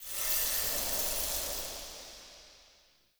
UI Action Hold Start; Nightfold

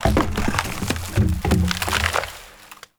Forest Tree Fall; Warframe; Awakening Remaster

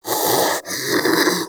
Armadillo Vocal Breathe; Warframe; Characters; Hunting Critters

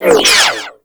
Corpus Energy Proj By

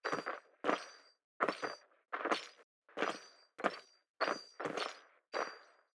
Foley Distant Steps; Dax; Warframe; Cinematics; DuviriQuest; Courtyard

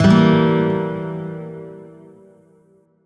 Shawzin Phrygian Chord; Warframe; Lotus Shawzin

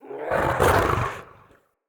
Wolf Attack Growl Spawn; Orengall; Animals

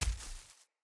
Walk Veg, Footsteps; Enemy; Mockery; Mockeries

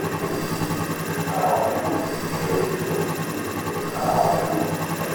Mind Control Loop; Trinity

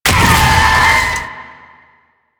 bike damage; Rusalka; Warframe; NineteenNinetyNine; Stage8ShitboxConvoy

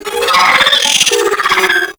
Riot Moa Vocal Reboot Reactivate; Warframe

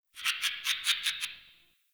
Tree Critters Angry; Warframe; Duviri; Ambience

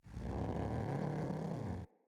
Vocal Idle; Warframes; Khora

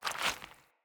Stuck Sludge Foley Move; Encounters; Animal Rescue

